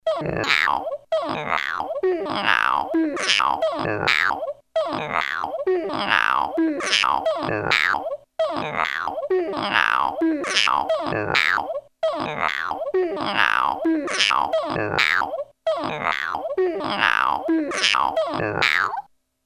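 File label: Cortex cat, prepared rhodes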